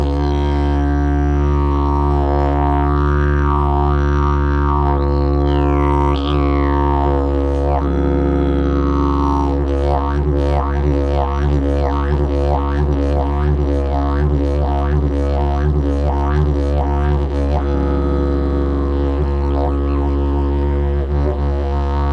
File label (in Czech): jilm, didgeridoo; drakomor; 28 mm; moření, olej; Cis - D; Tomáš Dufek